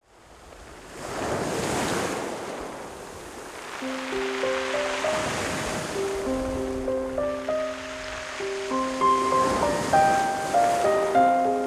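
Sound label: ocean life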